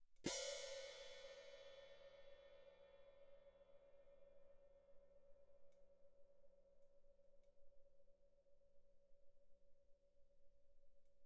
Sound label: hihat, foot splash, mf; Percussion; cymbals